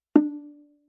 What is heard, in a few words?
Viola pizz ff sul C D4; MIS Pitches - 2014; Strings